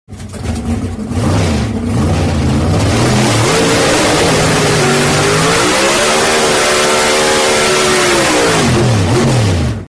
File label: Pro Stock Burnout